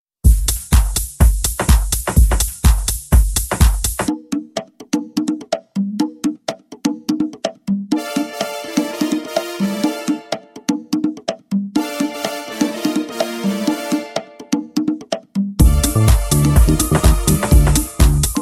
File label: Dance